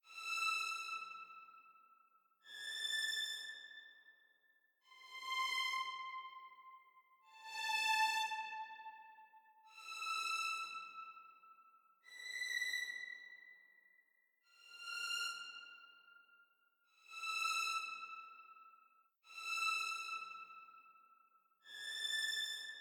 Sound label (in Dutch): Violen; Kort; Toki Tori 2